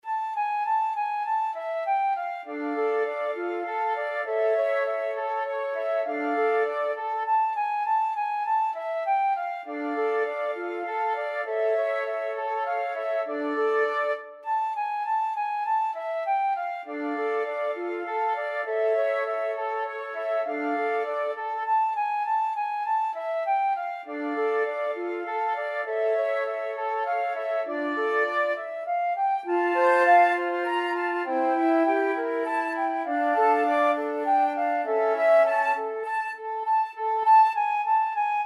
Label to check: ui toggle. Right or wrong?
Wrong